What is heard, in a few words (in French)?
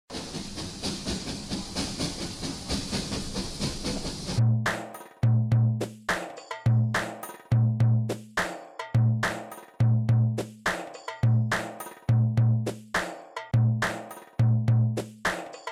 SANS VOIX